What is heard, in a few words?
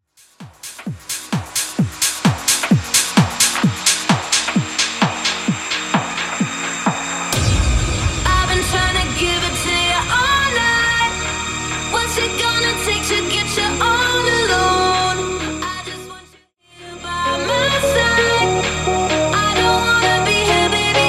Dance House DIRTY 130BPM